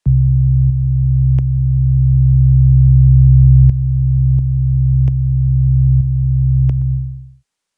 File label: sine; LANDR Medium